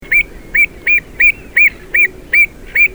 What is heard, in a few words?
Recurvirostra avosetta